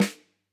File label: close Snr, drum